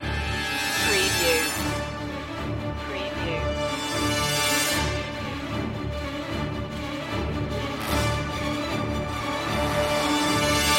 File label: war of attrition no choir no perc